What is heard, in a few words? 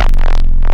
Dist Bass Dropper, Digital; Xfer Records Serum; Serum Presets